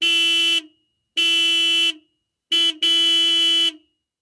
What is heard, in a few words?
car horn